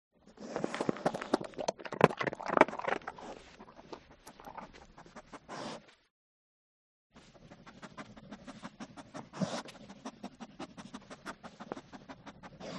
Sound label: DOG Stafford Eat Sniff Long; ANIMAL; smartsound